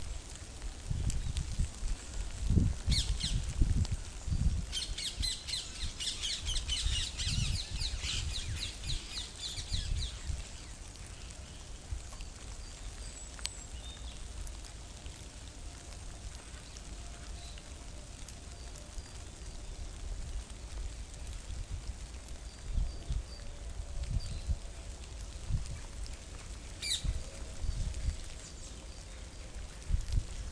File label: birdsong in Greenwich Park